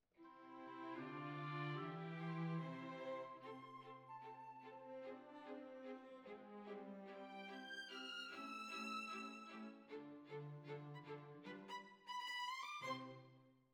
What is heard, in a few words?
Violins Over